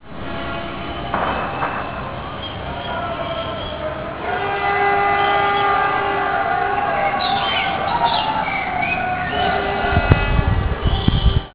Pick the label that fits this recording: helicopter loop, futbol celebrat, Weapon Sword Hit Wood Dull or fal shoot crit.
futbol celebrat